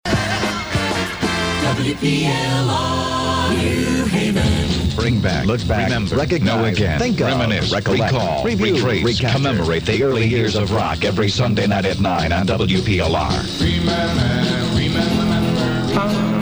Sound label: jingle; WPLR; Oldie Show Promo